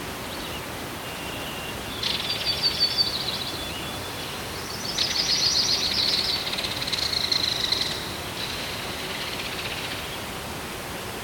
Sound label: Birds Loop; kult